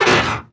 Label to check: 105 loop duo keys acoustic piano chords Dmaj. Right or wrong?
Wrong